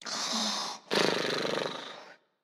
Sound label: Dog Sleeping; Prison Architect